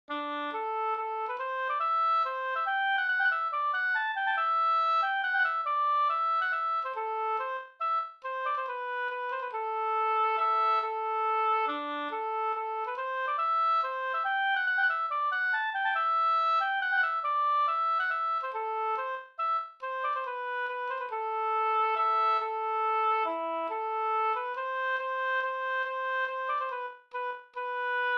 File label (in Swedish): vals; Gratarevalsen e FA Lindell Motala Og